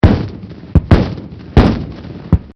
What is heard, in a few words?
Artillery Reports; Military